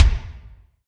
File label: Kik Hall, Bass Drum Kicks